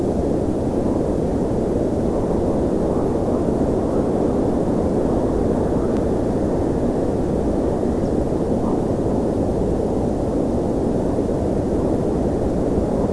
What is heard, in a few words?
WFR audio emfisis